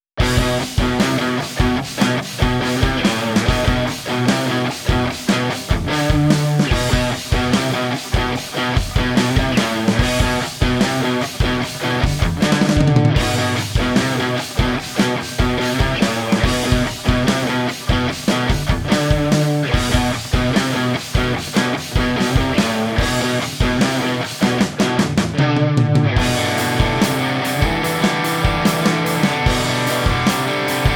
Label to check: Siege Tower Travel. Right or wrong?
Wrong